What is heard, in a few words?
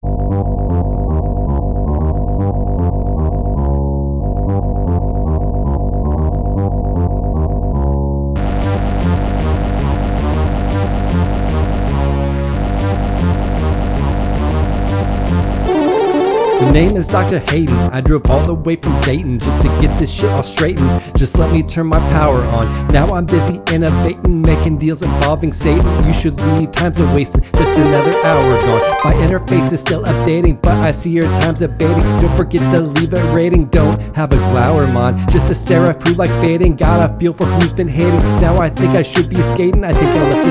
rap; Doom 2016